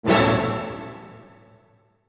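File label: military orchestra chord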